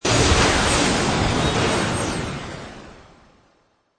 Magical Burst Shimmer Long, Magic sound of casting spell, conjuring or wizardry; Witchcraft ritual summoning elemental